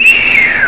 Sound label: WHISTLE